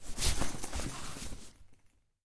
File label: Leather Armor; SyrinScape SoundsScape Collection; bbh Haunting part 1; TTRPG